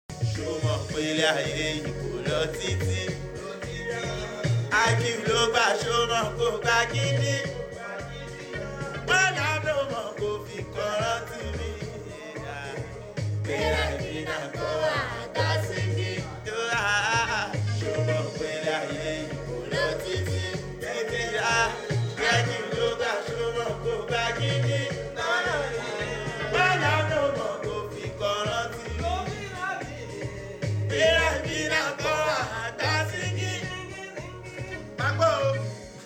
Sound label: Singing